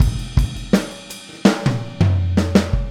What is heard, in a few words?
Boogaloo Fill Full Mix, 82 BPM; Sonic Foundry; Drums from the Big Room The Mixes